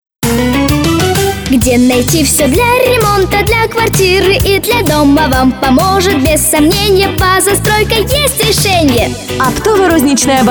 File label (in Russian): vokal